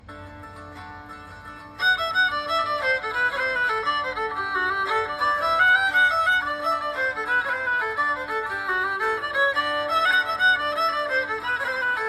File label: Tater Jack Walsh jig, Irish